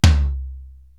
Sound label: Tom Floor, Korg TR-Rack